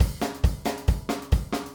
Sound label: 137 Zydeco Swamp Drums, Very Fast Tempo; Pop Rock; Pro Tools Essential Loops; Digidesign